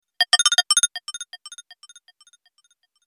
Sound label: happy jump sms